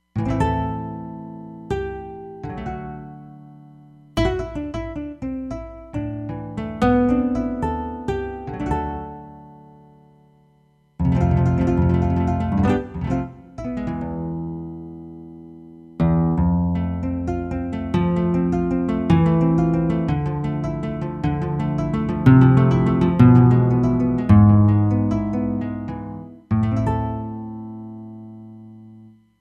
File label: Wet Nyln Gtr